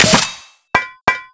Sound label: reload, weapons, plasmagun; hyperblast; Q2